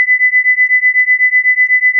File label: beats; intonation